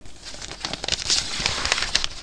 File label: scroll